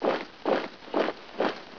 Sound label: march; Caesar 2